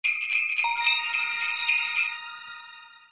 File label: Sweeper; jingles noel; Mac DO Jingles